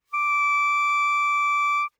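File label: Eb Clarinet fortissimo D4; Woodwinds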